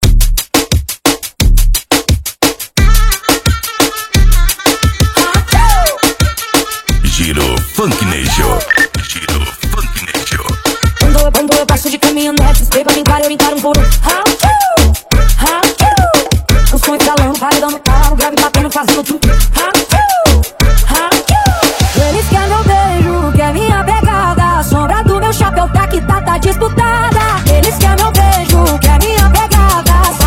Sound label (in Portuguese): FunkNejo